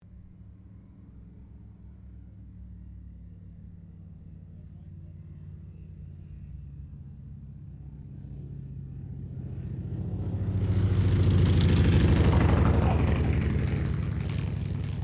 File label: AIRCRAFT T-28D TROJAN LAND; Airplanes; Vehicles